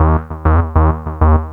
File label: XPANDER; AMG - Vince Clarke Lucky Bastard